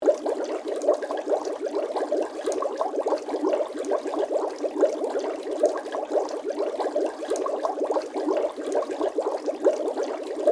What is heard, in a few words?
Water Bubbles Loop; Water Sound Effects; Water (Sound Effects:Nature); BlastWave